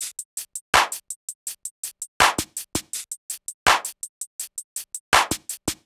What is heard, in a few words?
Hip Hop Beat, Drums